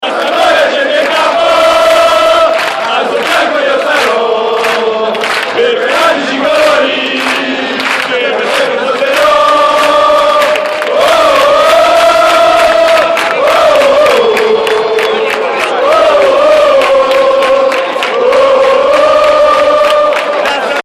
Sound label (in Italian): la sampdoria scende in campo, cori